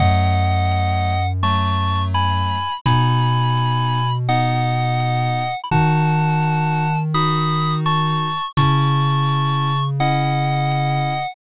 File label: bgm mellow